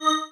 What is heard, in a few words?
marimba